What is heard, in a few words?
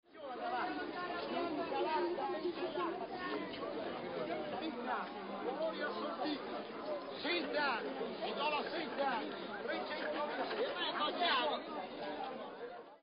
market